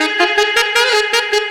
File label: Dubby Sax; Effected; Sonic Foundry (Sony Creative Software); Jazz Solos and Sections